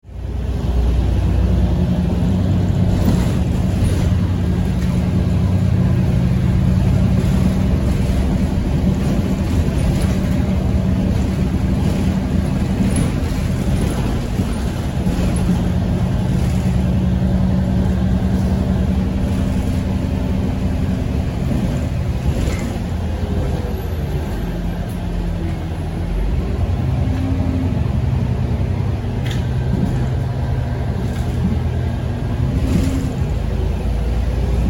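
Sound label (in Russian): ezda v pustom avtobuse, ride in empty bus; Автобус закрывает и открывает двери, езда в автобусе, шум двигателя Общественный транспорт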